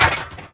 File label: Armour Hit; Civ4 warlords